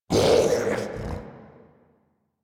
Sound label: Pain; Grineer Ghoul Devourer